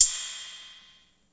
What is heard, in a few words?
splish splash crash, DRUMS (SINGLE HITS), Crashes